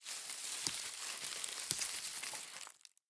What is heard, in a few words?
leaves, foraging